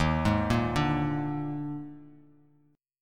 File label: Eb Major 7th Suspended 4th Eb, Bass Standard; arpeggiated; 11,11,8,7; EbM7sus4